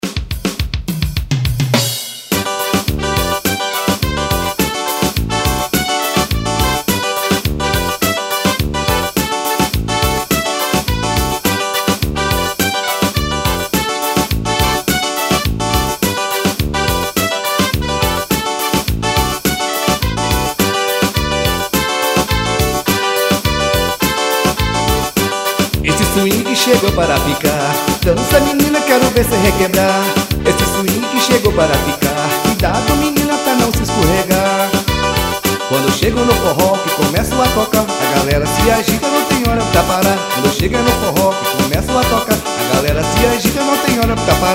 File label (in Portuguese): swing; forró